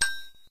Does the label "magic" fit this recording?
No